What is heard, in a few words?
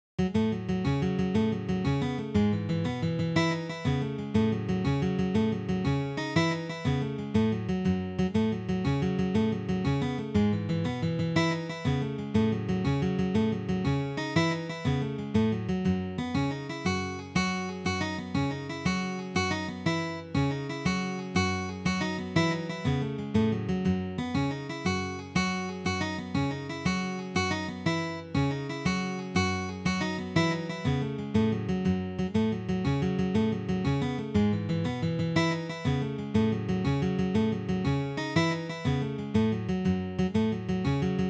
2 part jig; Swallowtail, The